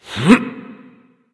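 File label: grunt lift, male; smite; voicepack; rama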